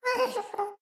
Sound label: moan, ghast; mob